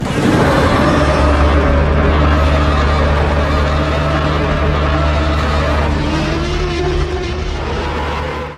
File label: Goji roar; Evolved